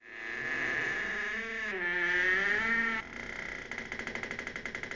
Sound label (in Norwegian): knirk